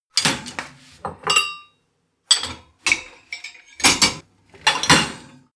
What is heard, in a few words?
dishwasher load